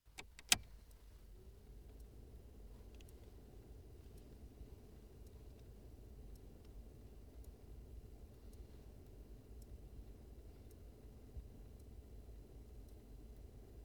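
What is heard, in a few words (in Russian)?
печка в авто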